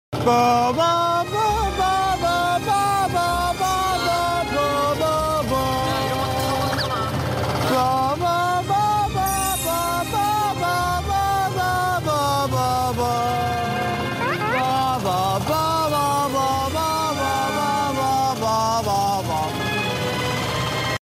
Sings BGM

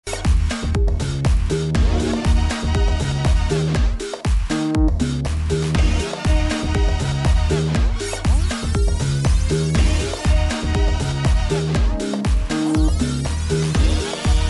Loop pads

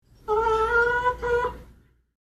Chicken

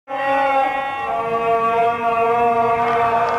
Siren; Comstock

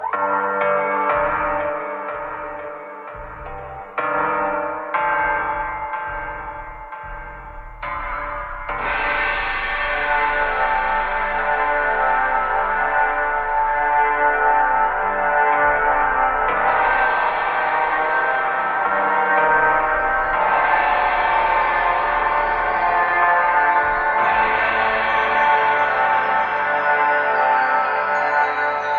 Guitar Surprise

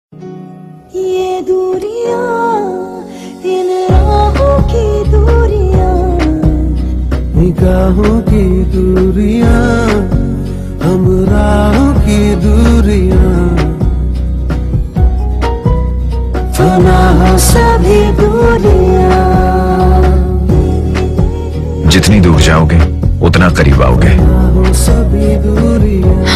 Alto Mix

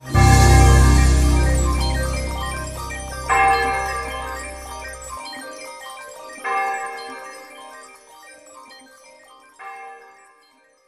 Twinkling Lights

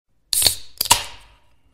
Beerr

